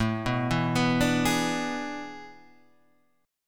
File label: A Major 7th Suspended 2nd Suspended 4th, Guitar, Standard; arpeggiated